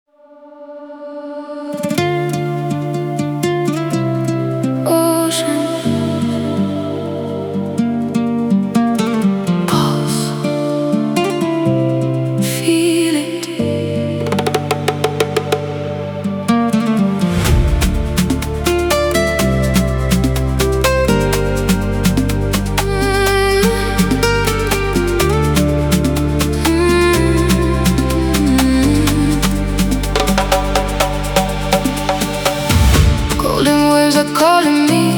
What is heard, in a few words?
Ocean Pulse Afro House